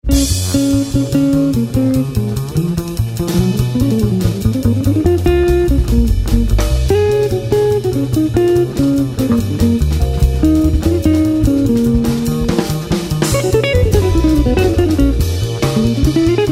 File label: Jazz